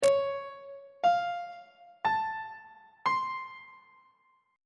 b flat harmonic minor 7th chord 3 is d flat augmented major 7th on treble clef